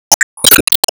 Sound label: Enemy Weakpoint Hit